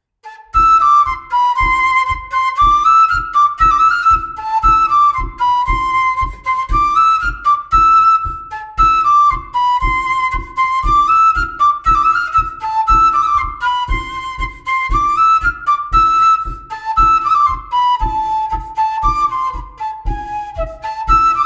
La Bourée d Auvergne; Noëls Collette 1721

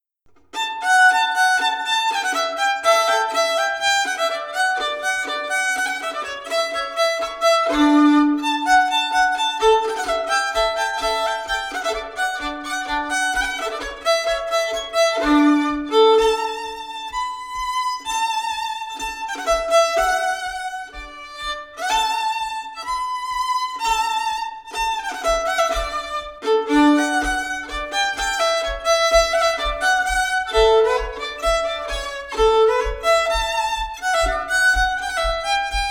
Marche

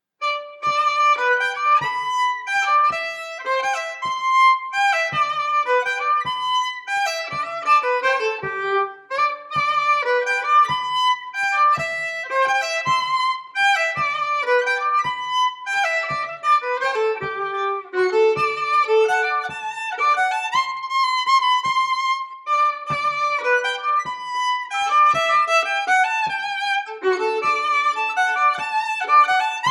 Valse

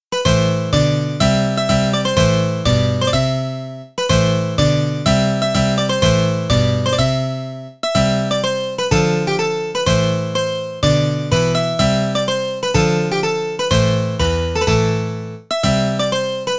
Bourrée rythme arrangé; Bourrée de guilheur esty

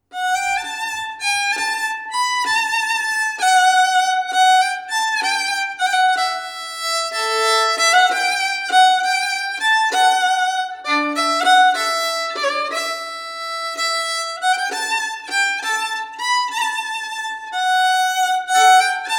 Valse

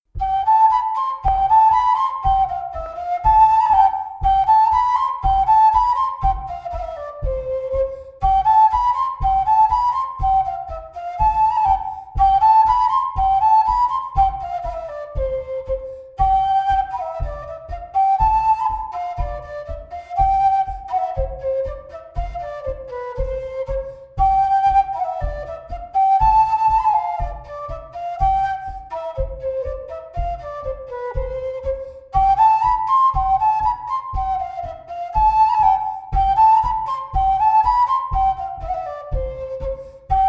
Bourree; Recueil De Raoulx

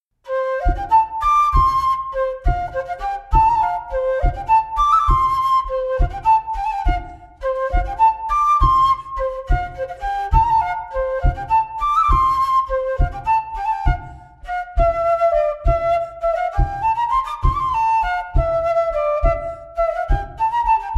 bourree; Coatrieux

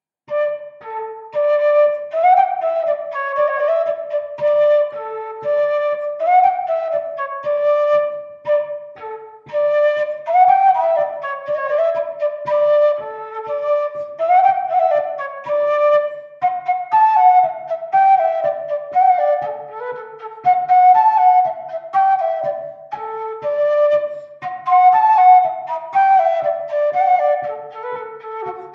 Bouree; Ms Dauternaux; Dis moi Nanon le nom de ton village

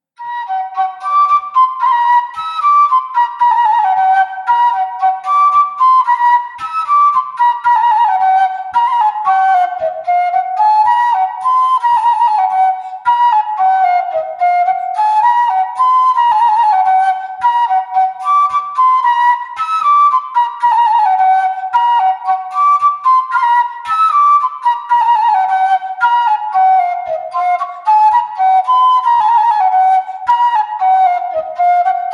Dance d'Auvergne; Chédeville; Les Dances amuzantes 1733

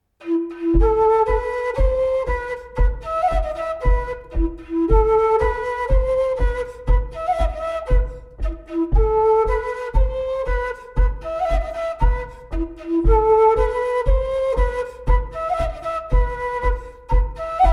Bourrée; Recueil Lemaigre

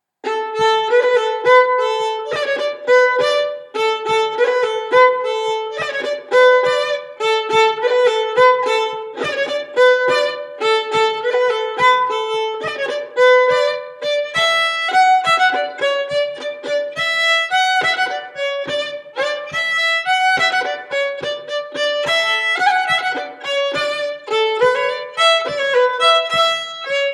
Montagnarde; Recueil Hainl 2; Montagnarde de Mont Dore

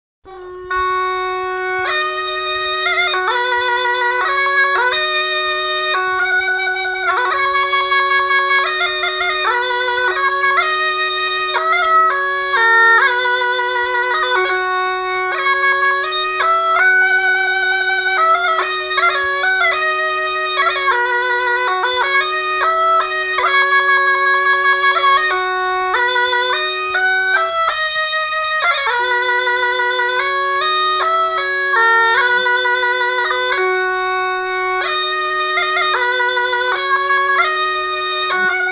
Mélodie; L'âme Entendit

